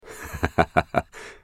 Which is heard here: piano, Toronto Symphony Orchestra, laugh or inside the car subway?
laugh